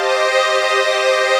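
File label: chord pad, Pads; Best Service; XX Large - Pads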